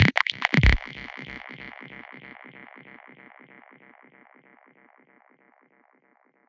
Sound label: Squelch C 142 BPM, Psytrance; Ghosthack; Psytrance Visions Volume 2